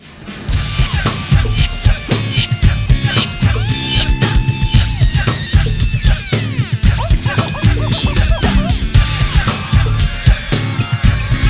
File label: trance mono